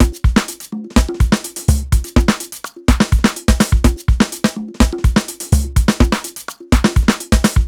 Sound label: top full, 125 Beats